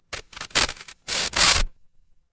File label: paper fabric tear